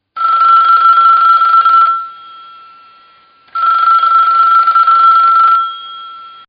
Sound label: Phone Ring Twice; Telephones; Voice